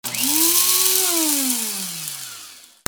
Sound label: KITCHEN